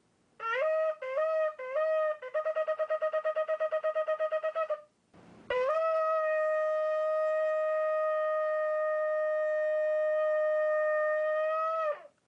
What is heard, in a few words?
Shofar Blowing; Yom Teruah; NVU The Aleph-Tav Project